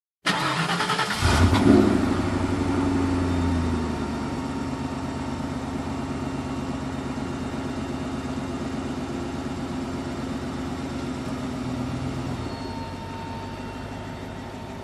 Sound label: Car engine ignition